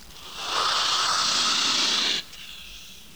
teeth, alien; guns

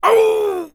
pain; darkwing

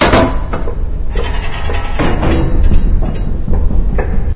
train; sven

